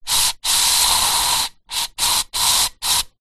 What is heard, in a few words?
household aerosol spray insect killer empty spray various